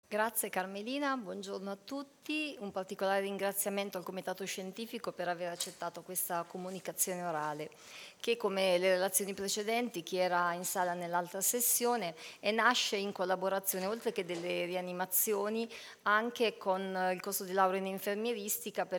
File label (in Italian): campanelli